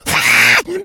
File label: ignite, infected male; Left 4 Dead 2; npc